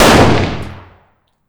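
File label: fire dist 556x45 rifle int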